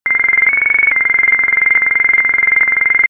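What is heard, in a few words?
ringing sms short loud melody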